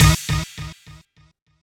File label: hit one shot bounce